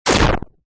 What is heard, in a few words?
Static Rip